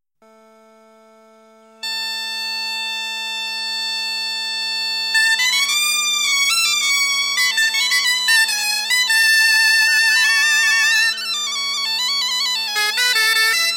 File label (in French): Gavotte Bal